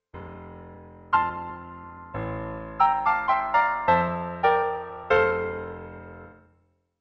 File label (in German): Kadenz; Unbekannte Kadenz zum ersten Fassung des B Dur Konzertes